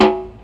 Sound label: Timbales; Drums; One Shots